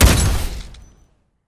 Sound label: shoot; EMXArms; emx kund50